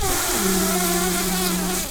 bumblebees, Pascuorum queens

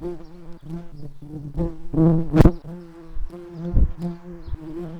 Lucorum workers, bumblebees

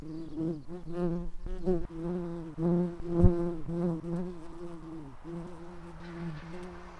Pascuorum workers, bumblebees

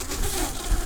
bumblebees, Pascuorum queens

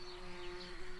bumblebees, Hypnorum workers

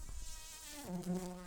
Humilis workers, bumblebees

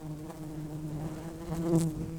Lapidarius workers, bumblebees

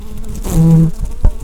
Lapidarius queens, bumblebees

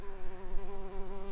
bumblebees, Hypnorum workers

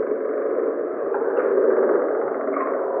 Common or Grass Frog

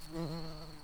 bumblebees, Pascuorum queens

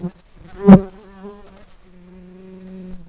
Pascuorum workers, bumblebees

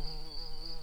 bumblebees, Lucorum queens

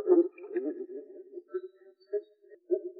Moor Frog

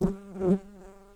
Humilis workers, bumblebees

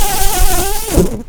Lapidarius queens, bumblebees

